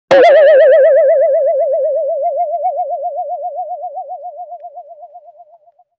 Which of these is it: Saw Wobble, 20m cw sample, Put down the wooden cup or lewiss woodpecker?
Saw Wobble